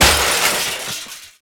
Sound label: Break Glass Default; breakable